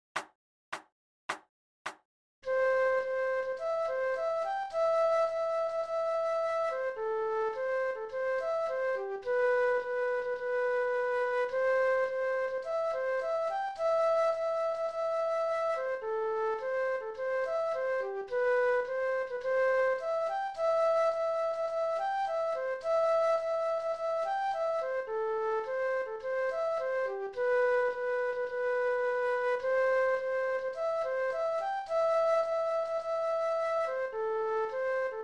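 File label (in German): Floete, 106 bpm; Edinburgh Tattoo; Scotland the Brave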